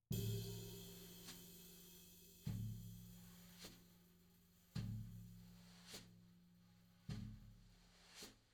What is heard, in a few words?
tom